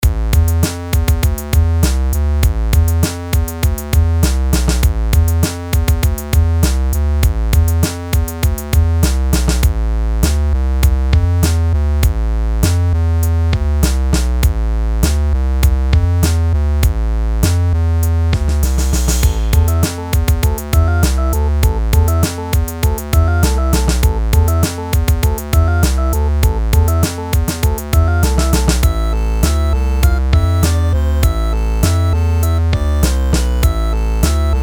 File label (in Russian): около хип-хоп